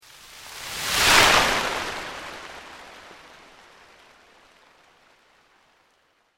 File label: Sweep Organic Slow